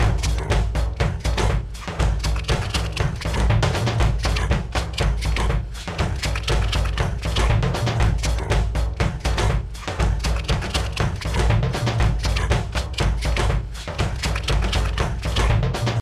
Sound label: Fight beat